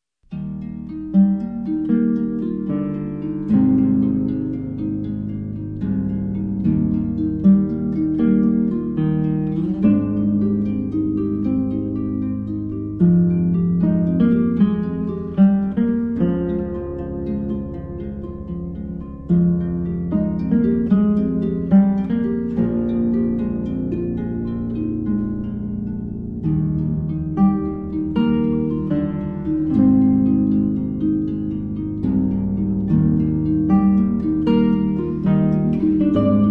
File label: harp and guitar